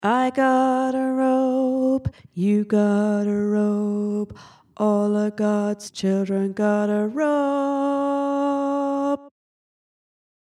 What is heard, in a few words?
Al gods chillun Tenor